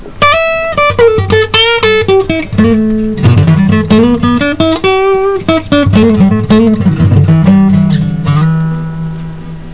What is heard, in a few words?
Blues